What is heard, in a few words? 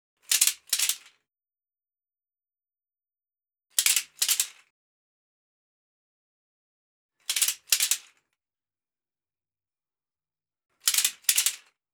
Bark Rattles; Nightsong (Hobeea)